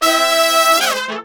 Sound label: 100, BRASS SAMBA